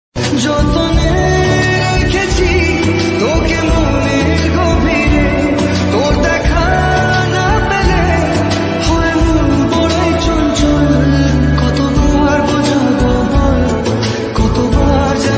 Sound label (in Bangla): Bengali Ringtone; ringtones